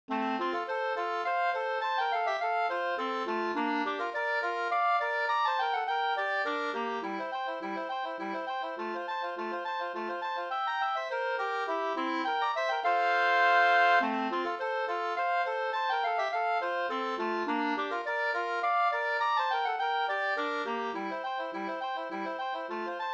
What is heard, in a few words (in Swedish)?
slangpolska